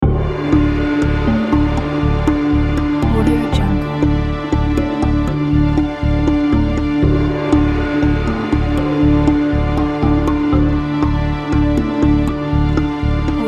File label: dark asian cinematic